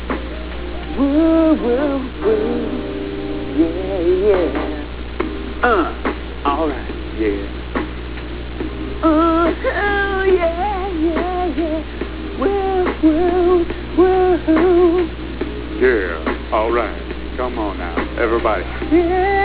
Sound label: groove; Hal does the groove thang